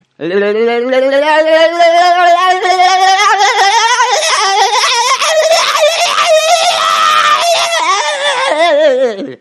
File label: frog in a blender